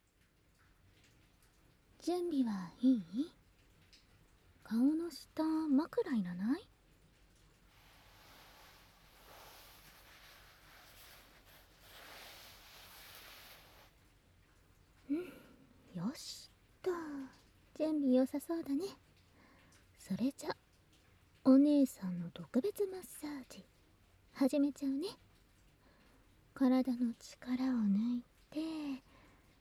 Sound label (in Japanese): ベッドで指圧マッサージ, ASMR; 効果音同時収録と効果音ロケで"本当に田舎で過ごす"を感じられるASMR生活～田舎でお姉さんに身も心も甘やかされて全部がどうでもよくなるまで気持ちよくなる～